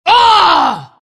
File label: grunt; Legion Commander